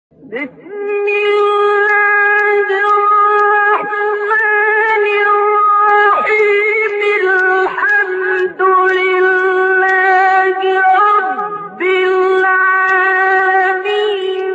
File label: Al Fatiha; آیات و اذکار قرآنی; شادی اموات